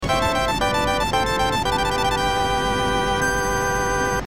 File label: email chime; Paper Mario 2 The Thousand Year Door